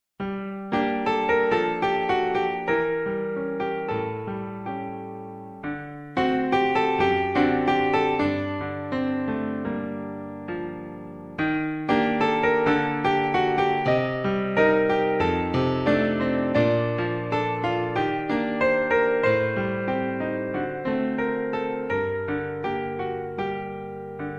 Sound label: Piano Bar